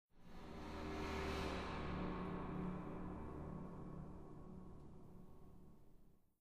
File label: stinger; Myst III Exile